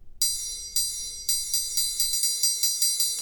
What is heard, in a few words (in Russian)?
treugolnik